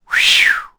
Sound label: sigh; Cars